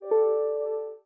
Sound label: Windows Message Nudge